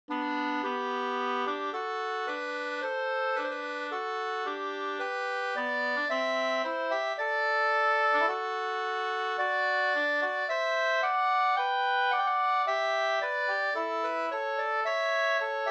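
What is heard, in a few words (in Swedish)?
brudmarsch Alvdalens brudmarsch 2 stämmor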